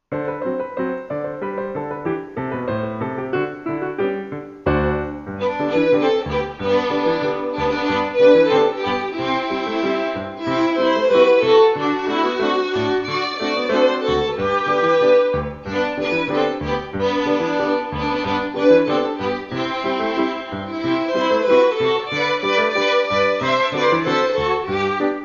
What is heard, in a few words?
Jingle Bells Piano Trio; Away in The Manger